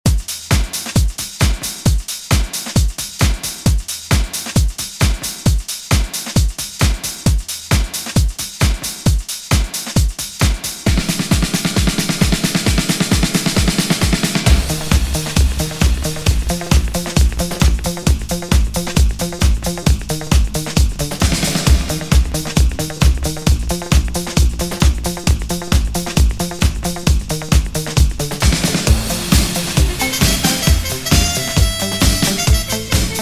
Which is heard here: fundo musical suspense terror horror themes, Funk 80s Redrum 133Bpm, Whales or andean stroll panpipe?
Funk 80s Redrum 133Bpm